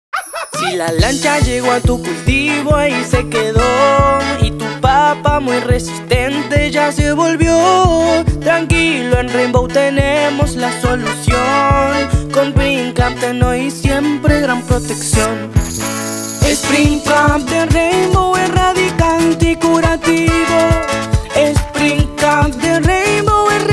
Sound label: jingle